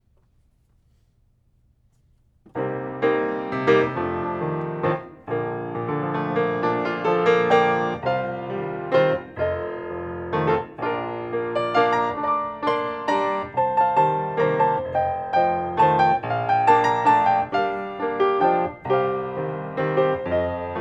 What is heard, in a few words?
Bb waltz longer; Bayadere